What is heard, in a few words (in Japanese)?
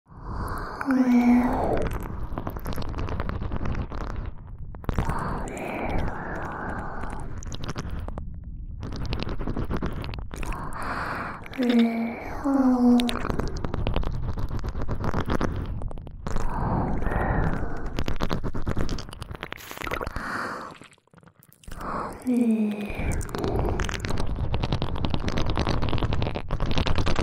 ぐぽぐぽシンクロ耳舐め, ASMR; 耳元ループ; おまけループ（オナサポ）